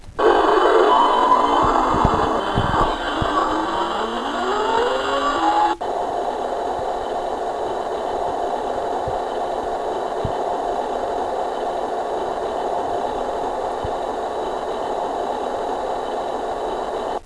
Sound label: falcon Start